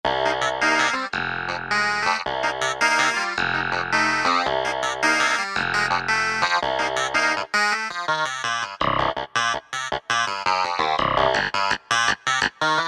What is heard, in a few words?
Chrs. Clav, Korg MSC-6S; Fretted Insts 1